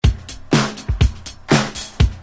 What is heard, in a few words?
BEATS